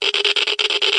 geiger high

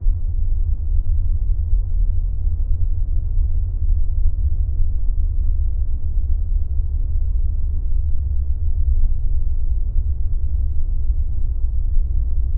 cave loop; Spookystation

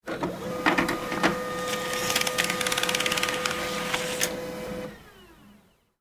printer

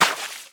water splash

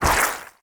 resin, alien

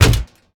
bang

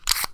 change drill

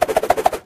bola throw, weapons

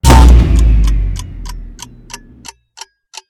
time paradox, magic

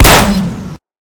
Laser Slug, weapons

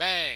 bang

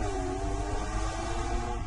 car rev

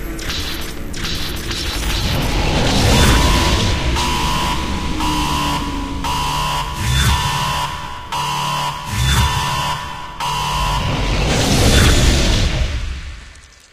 Alarm, machines; Polaris

